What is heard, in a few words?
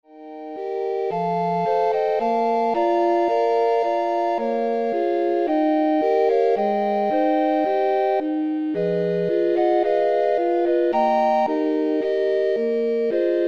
Recorder Ensemble